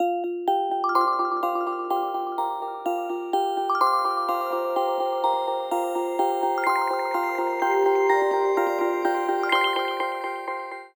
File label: system ringtones abstract